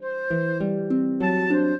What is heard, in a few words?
trio, flute harp; Mozart